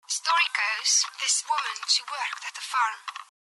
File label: high pass